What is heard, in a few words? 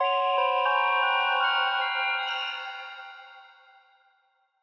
You Ve Been Trapped Short Sting